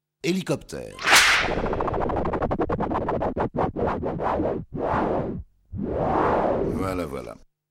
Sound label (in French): jingle helicoptere; supernana